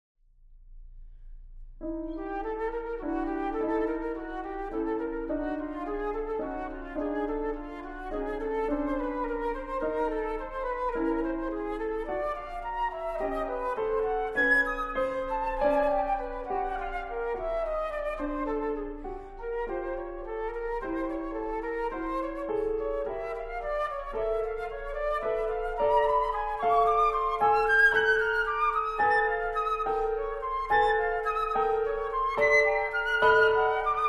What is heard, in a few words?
Ballade for Flute and Piano